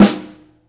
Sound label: Dirty Snare, Snare One-Shots; KJ Sawka; Drum n Bass & Beyond; Drum Tool Box